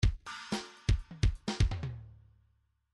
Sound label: bdf midi file grooves pop b pop b